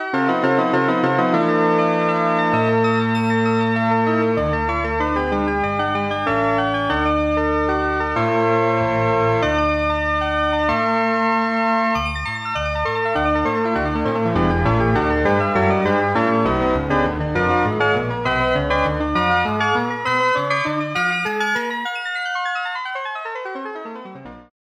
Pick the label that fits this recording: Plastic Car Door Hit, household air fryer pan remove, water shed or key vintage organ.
key vintage organ